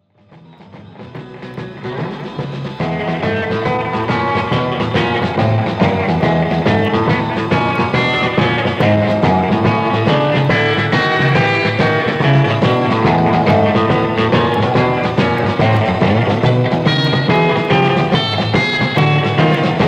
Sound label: blues, guitar